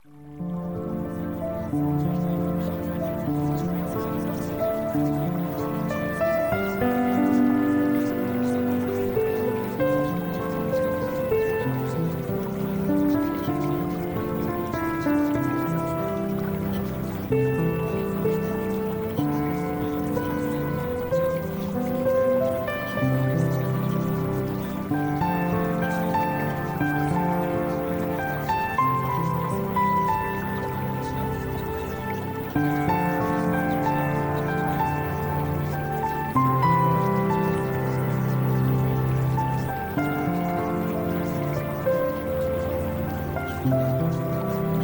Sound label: Music Sample